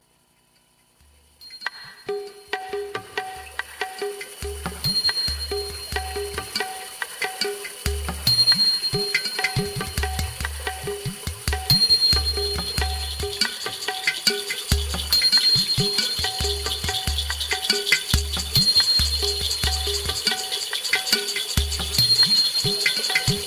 celebration musique chamanique tambour

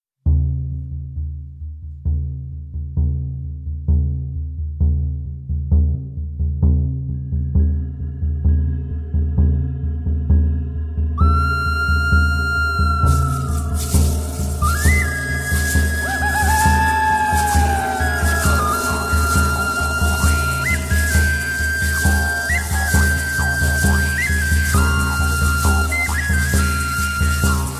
musique chamanique tambour

